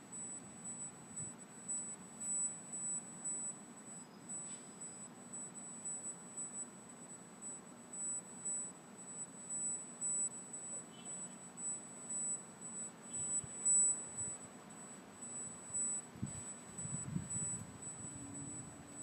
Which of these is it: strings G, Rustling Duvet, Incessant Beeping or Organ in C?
Incessant Beeping